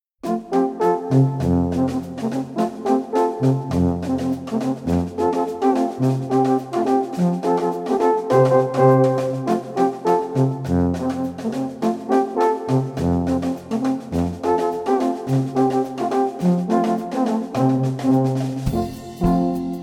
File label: Alphorn Charleston; Alphornorchester